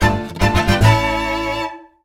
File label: Fanfare Common Item; "Get Common Item (treasure)" fanfare; zelda